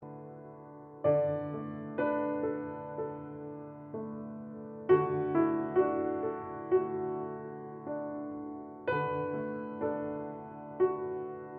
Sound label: Bamboo Flute